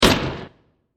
Short Hit; Bursts; Impacts; CraftedSignals

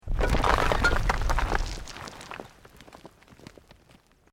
ROCK DIRT SLIDE SMALL

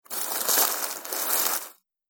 Packaging Paper Noises; Foley Sound Effect; Andrew Fleming

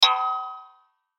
Hit Marimba, Hit Marimba Metallic; Mallet; Blastwave

